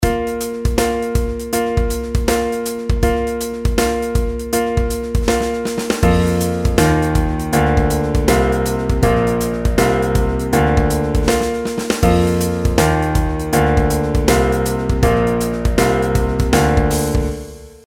pitch patterns stepwise

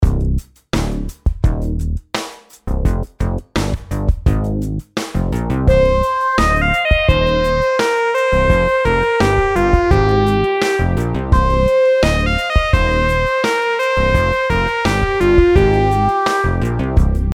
rhythm simple 8s triplets

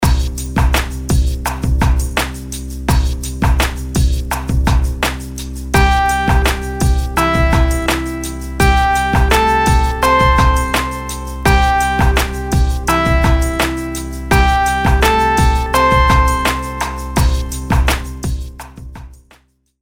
melodies simple Cmaj; 4s; melody

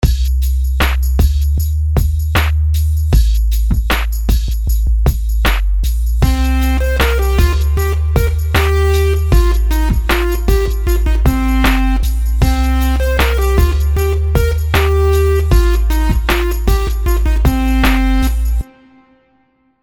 rhythms simple 16ths

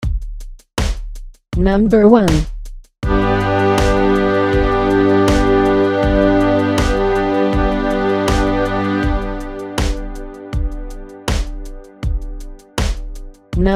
chord quality id; harmony

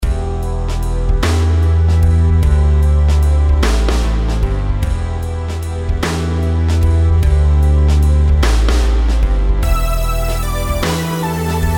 rhythms compound 8s

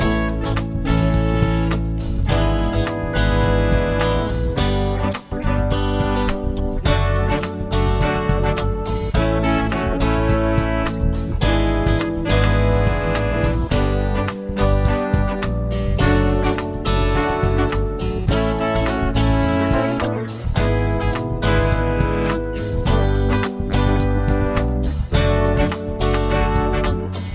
chord prog with mixture

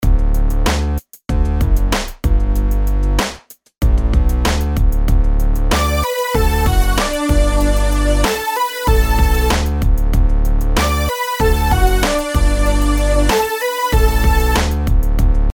rhythms simple 8s major

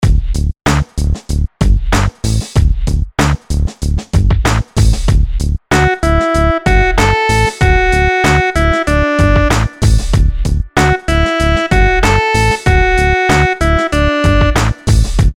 melodies simple syncopated, Dmaj; melody

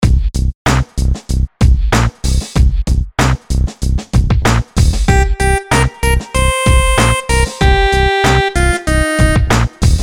rhythms dotted eighths; simple dotted 4s

